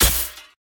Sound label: wall of spears wall damage; smite; odin